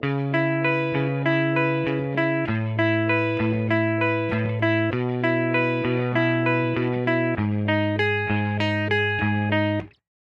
GTR LOOP 111 Bpm DRY Key E

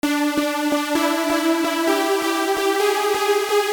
BRASS

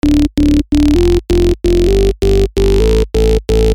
BASS Saw

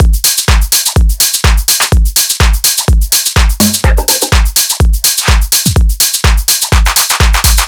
125, Drum Loop Full

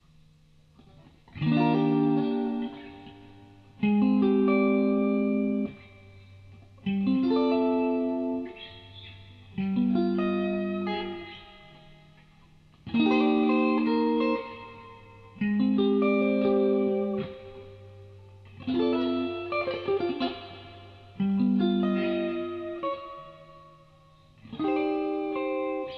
Guitar

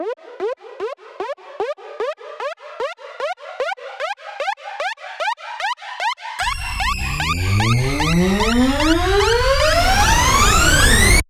upsweep bars

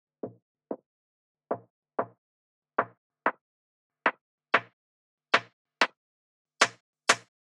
Rim Filtered 94 Am

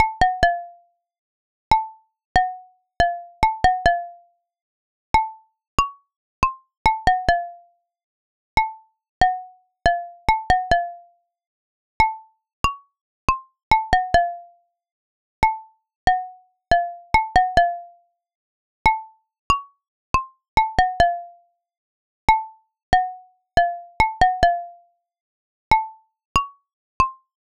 Plucks Dry 70bpm

